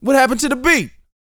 VOX, R&B Dance, HIP-HOP; Spectrasonics Vocal Planet

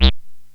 synt, Juno-2; Giga Samples Collection; Bass

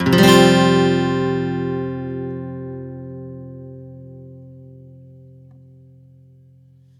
F add9 downstroke, Acoustic Guitar; Sonic Foundry (Sony Creative Software); Songwriter Acoustic Guitar Companion; One Shot Chords

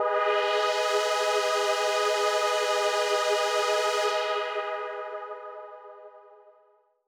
Dramatic G#4; KG MegaSaur

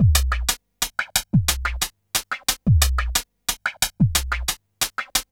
whippy 090bpm, downbeat dance grooves; Zero-G

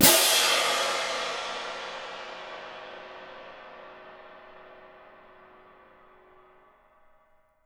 CYM MF, Percussion; Miroslav Vitous - Percussion & Harp

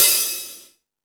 Lazer Drums, E-MU Pro-Cussion; drum machine

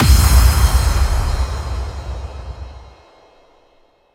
FX Kicks; Effects; Vengeance; Essential Clubsounds Vol.4

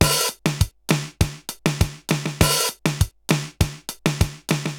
RX17 (100BPM), cyborg beats; musicradar

